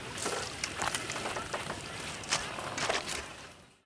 pottery; PHARAOH

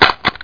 reload; duckhunt

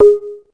tone; cuddle n care; PUPPET

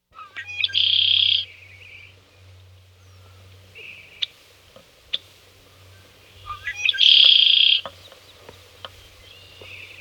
home blackbird, Restore your Shore

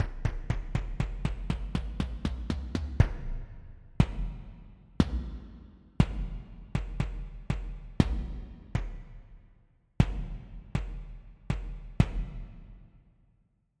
String Slap

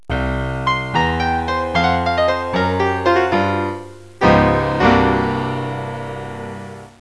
Boogie Woogie Piano Ending; Jim Heid’s Sounds

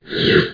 whoosh; Requiem

